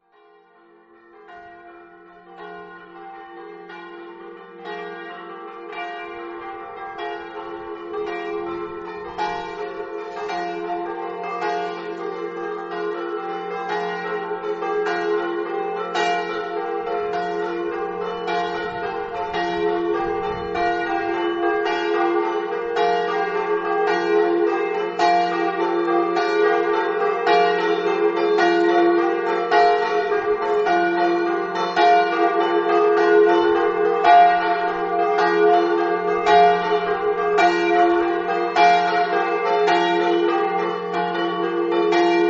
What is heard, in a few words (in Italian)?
scampanata